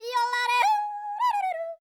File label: YODEL, World Voices; ILIO; Vocal Planet